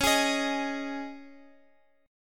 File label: Db Suspended 2nd Guitar Open F; strummed; x,20,20,22,x,x